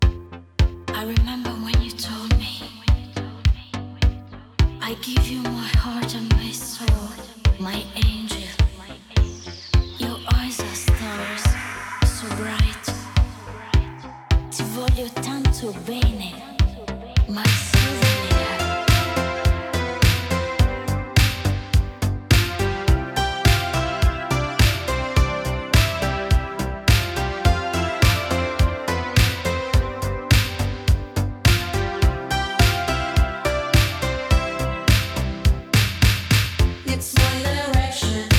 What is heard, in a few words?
Saxophone Souvenir Vocal Remix